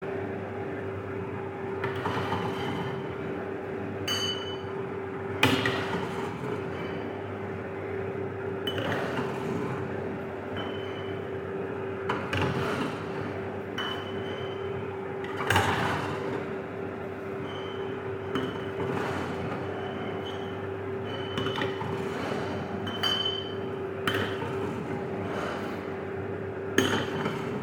agent processing; adminforth agent

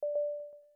verification accepted

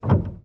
piano

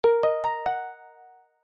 incoming chat

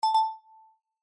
notification

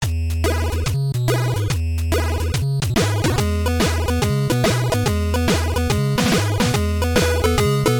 title screen; session-start; asterix

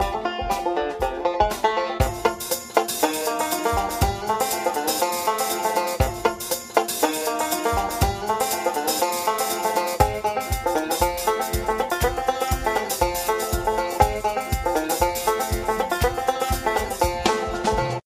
whack, bgm

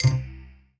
Hit; Indigo; 16bit

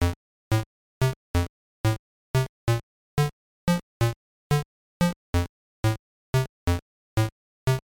stage theme; popeye

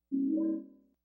save point; silent-hill

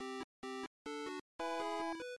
outro

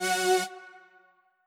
error

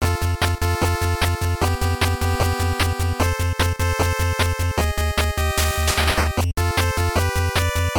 level theme; robocop

